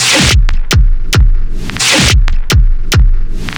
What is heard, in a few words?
Glitch Loop